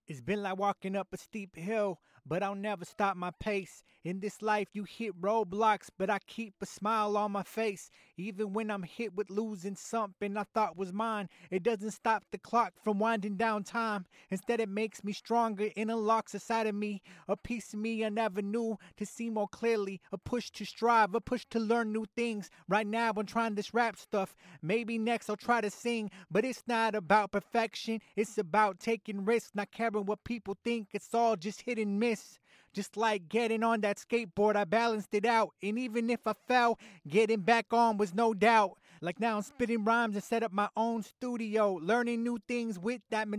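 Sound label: hip hop 85 BM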